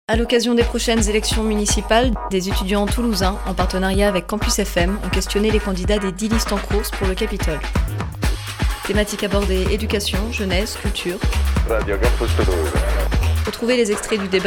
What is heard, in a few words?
jingle